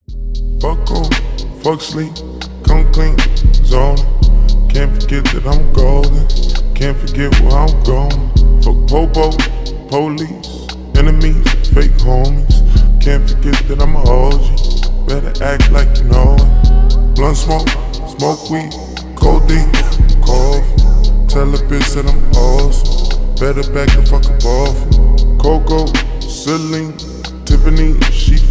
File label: slowed reverb